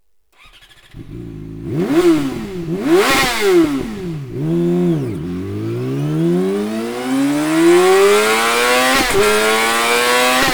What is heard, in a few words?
gp slip on exhaust yamaha r6; akrapovic